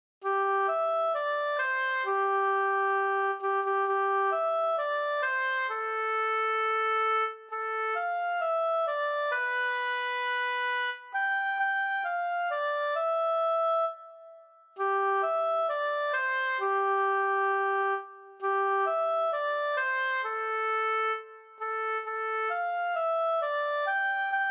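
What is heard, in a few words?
solo oboe; Jingle Bells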